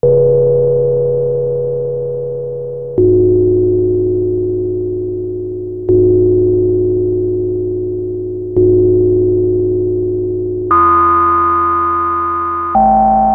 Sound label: GONG, Roland SH-101; Factory Patches